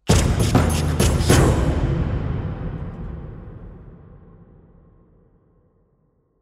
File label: win